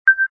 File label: Increase Sound; Extra Lives